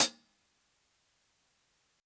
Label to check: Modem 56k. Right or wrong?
Wrong